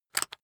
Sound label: rock drill head attach heavy; maschinen; bohrer; soundpool